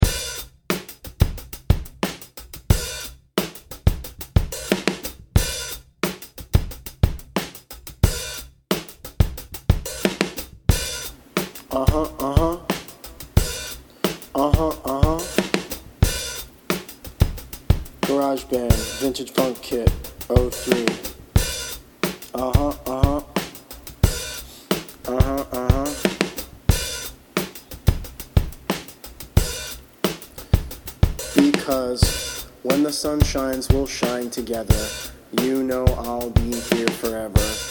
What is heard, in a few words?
vintage funk kit; umbrella